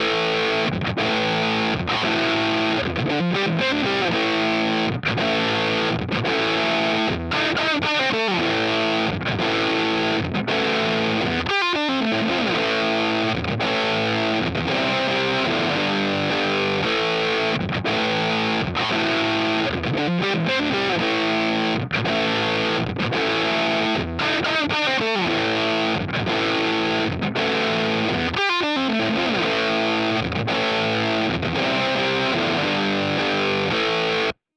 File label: Nux Soldano Slo 100 Slo Cabinet; Audiovergleich Mooer Nux